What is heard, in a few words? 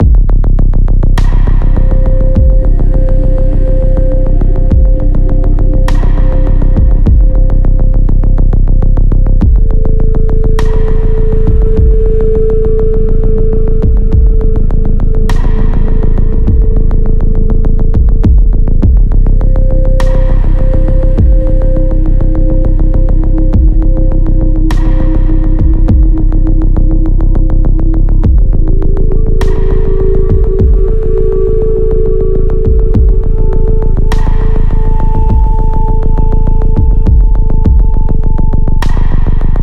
Tense